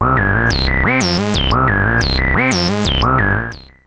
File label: SH101; SYNTH AND ELECTRONIC